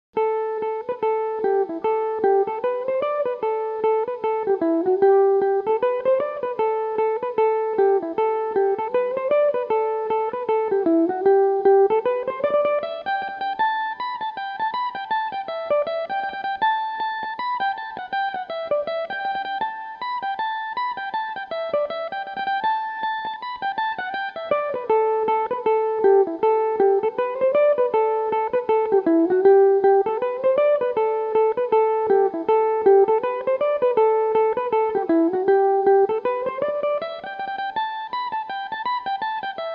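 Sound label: reel A dorian, Irish mandolin; The Abbey Reel; Belmuse EM-190 electric mandolin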